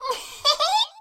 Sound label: block voice; guodongrenwu